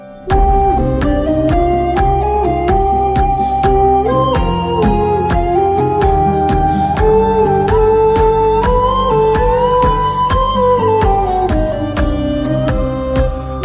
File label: BG Musiq Telugu Ringtone